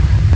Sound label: flyby, rpg7; weapons; Soldier Of Fortune II Double Helix; XBOX